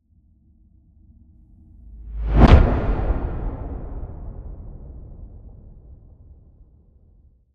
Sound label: Swell To Impact Metallic; bottlerocket